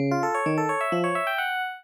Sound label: Retro Success Melody electric piano; Music